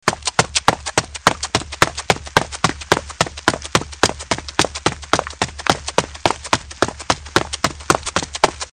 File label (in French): Courses beton, Sports